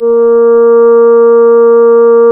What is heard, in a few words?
Synclav FM, WIND, A#; Big Fish Audio